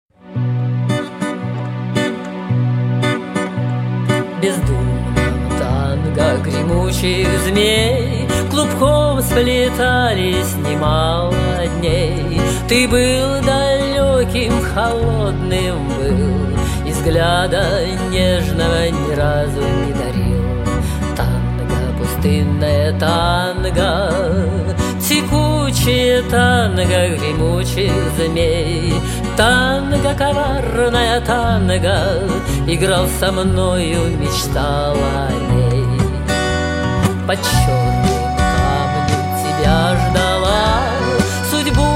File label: tango